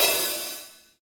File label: Hat Open Soft; hydrogen drum samples